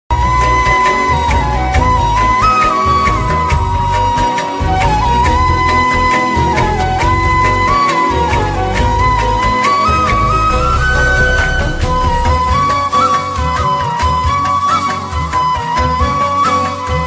Instrumental Flute Ringtone

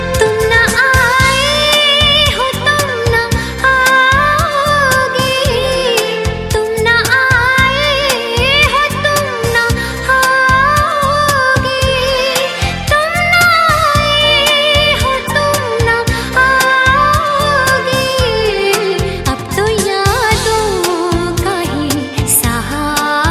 Sad Female Ringtone; ringtones

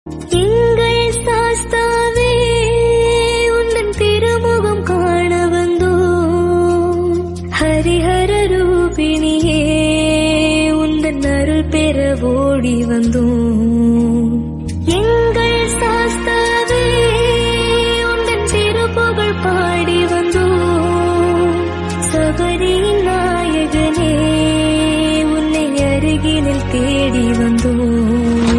Tamil Song Ringtone

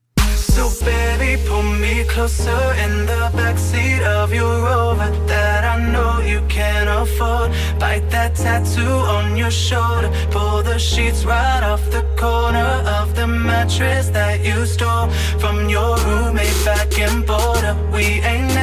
Ringtone iPhone

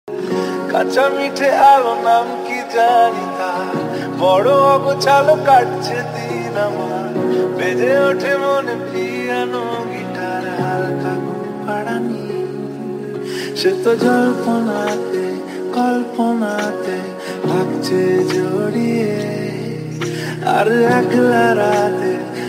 Bengali Ringtone

